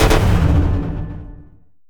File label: fail sound; osu